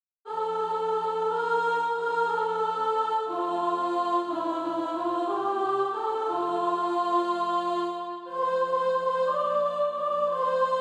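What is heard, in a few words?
soprano, SATB Practise Music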